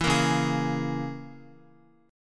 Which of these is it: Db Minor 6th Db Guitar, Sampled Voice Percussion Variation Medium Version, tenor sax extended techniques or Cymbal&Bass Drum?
Db Minor 6th Db Guitar